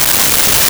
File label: skratch